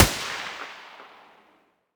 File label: muzzle M249 mono distant